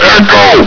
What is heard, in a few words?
echo